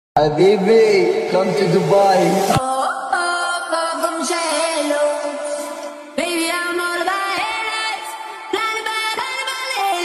english ringtone

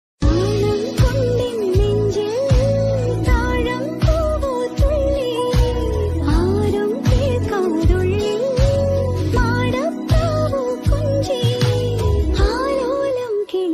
tamil love songs

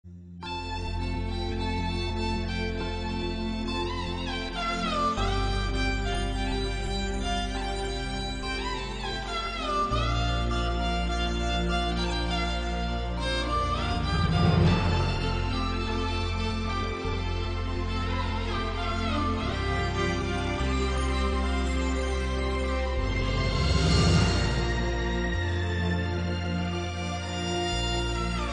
rock and roll Malayalam violin

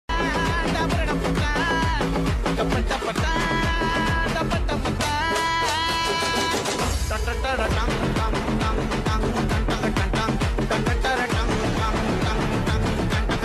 song bgm ringtone, dance, music song; kalki; prabhas

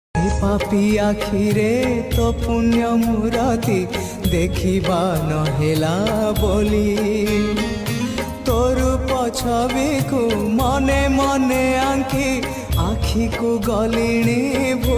bhajan odia

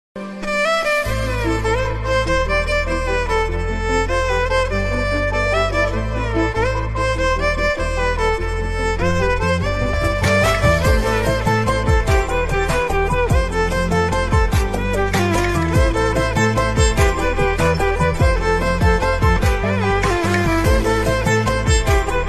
ringtone slowed reverb lofi ringtone song

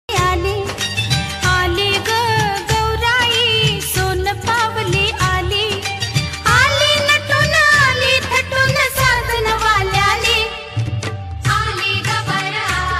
song marathi song

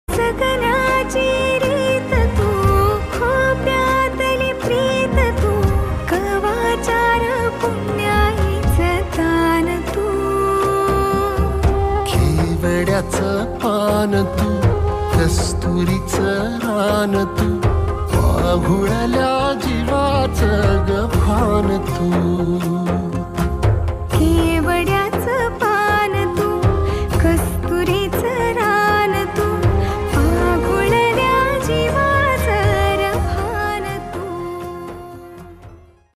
marathi trending song

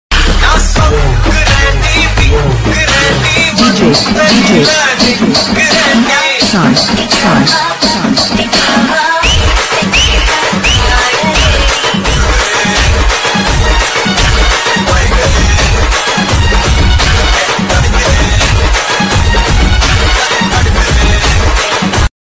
romantic tamil bgm song; pt sir movie

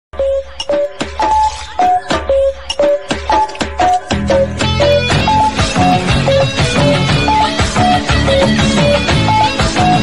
bgm, 8D audio; theeratha vilayattu pillai

